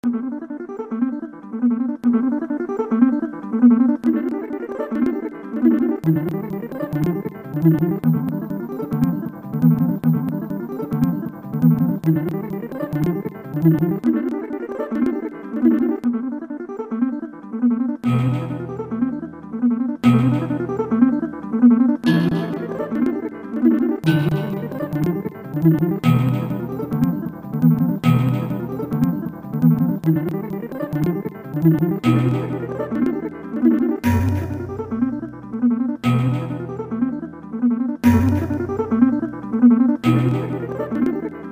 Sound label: speed arpeggios